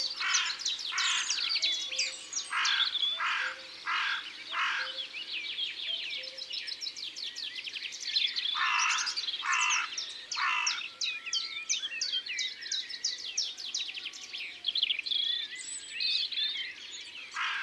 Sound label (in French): Corneille noire, ABC des chants d'oiseaux